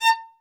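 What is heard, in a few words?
solo violin, strings; ILIO; Synclavier Strings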